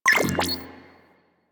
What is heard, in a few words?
UI Default Theme PM Received; Warframe